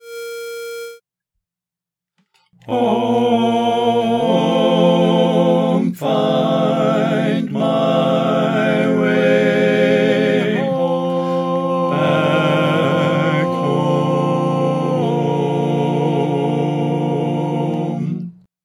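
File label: Baritone, barbershop tags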